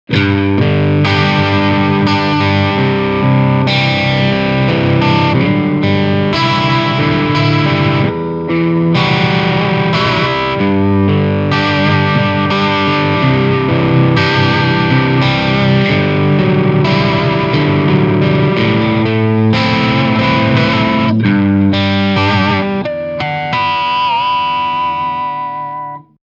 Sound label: Riff Crunch